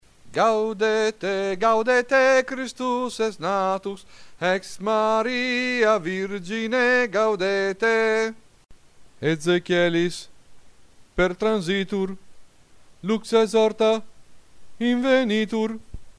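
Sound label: gaudete soprano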